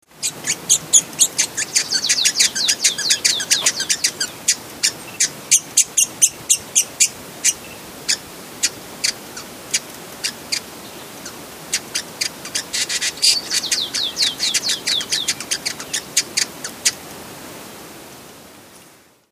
Hooded visorbearer, پرنده; آفتابگیر کلاهدار

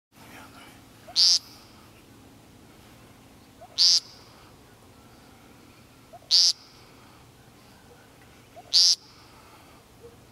Woodcock

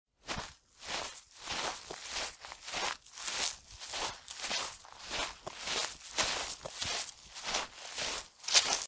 Walking on grass

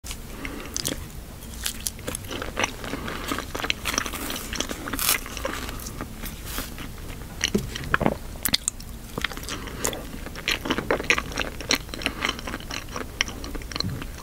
eating bananas

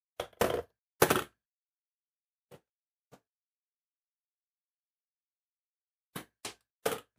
scales

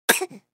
Baby-cough, سرفه نوزاد

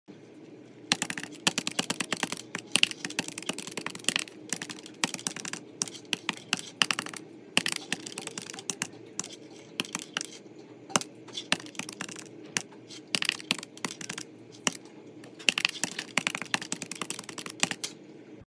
calculator; ماشین حساب

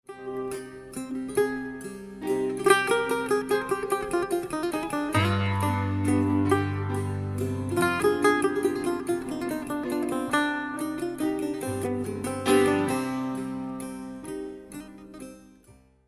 traditional ringtone

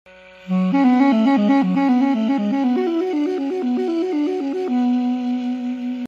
Duduk

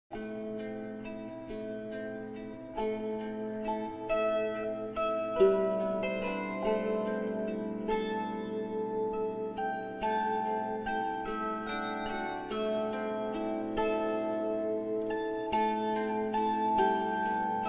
Lyre

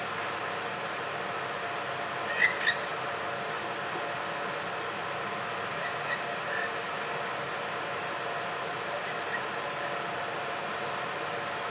Western swamphen

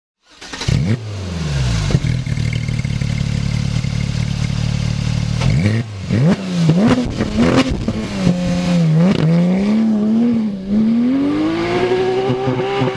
Porsche 911R engine sound

